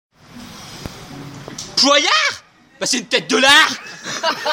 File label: vocal